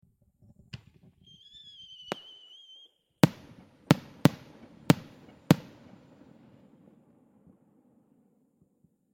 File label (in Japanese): 花火の開始の合図の音; firework